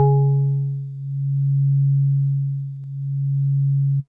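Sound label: House Organ DX100 C#3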